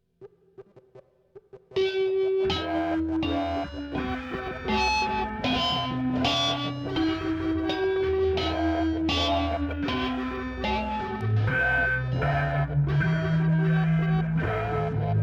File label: Ladrang Eling Eling, electro gamelan